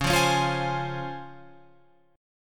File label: C sharp Major 7th C sharp Guitar Open F; C#M7; strummed